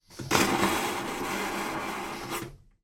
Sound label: transportation train toilet vacuum flush